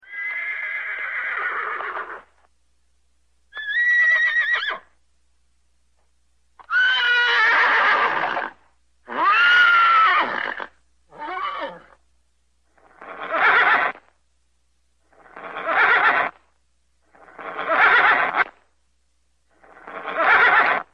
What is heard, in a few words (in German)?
pferd